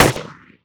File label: machinegun; Allegiance